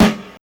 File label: snare 90s